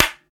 dry hand clap sound c key, percussion single hits one shots